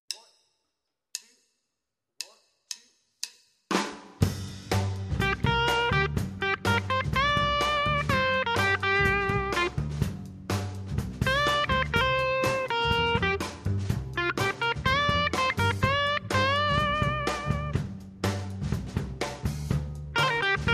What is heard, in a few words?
solo, new 4 note solo, blues guitar